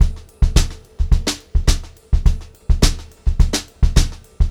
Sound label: HOTROD VARI, Brushed Drum Loops